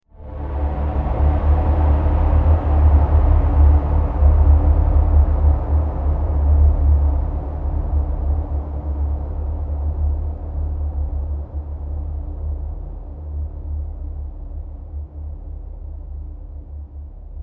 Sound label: heavy dark sound